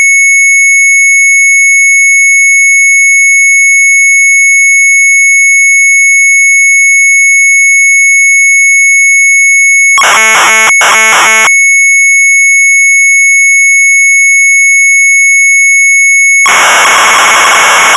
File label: MZ800 Tape, sharp; mz800 wav; TIJDSCHRIFTEN